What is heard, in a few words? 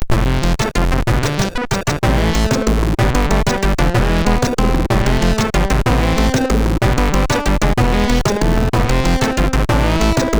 sid MOS6581R4; king of god